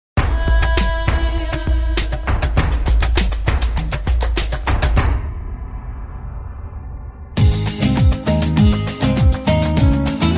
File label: Guitar